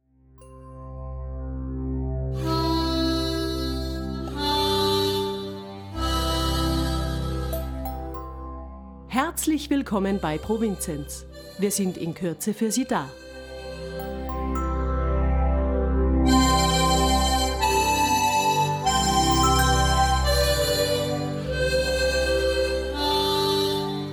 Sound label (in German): warteschleifenmusik; provinzenz